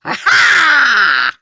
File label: Laugh; Warframe; Grineer Catbrow Handler; Battle Barks